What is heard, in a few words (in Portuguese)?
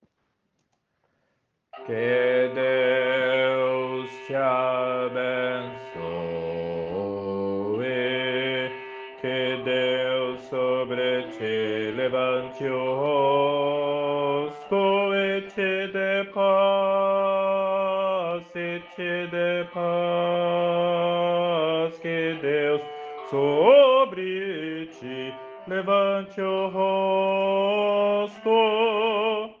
baixo; bencao aaraonica